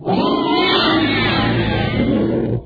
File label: monster death; Palm OS; EDGE